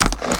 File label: pickup alliance rifle